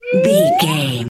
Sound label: whistle slide up